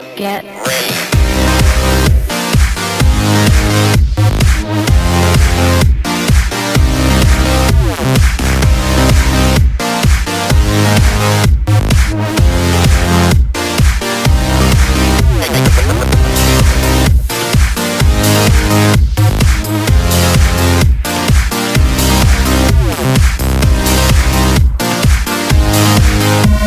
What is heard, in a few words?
Electro